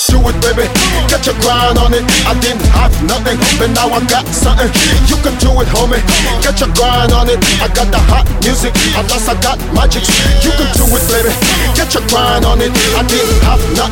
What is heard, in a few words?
Robot rapp drop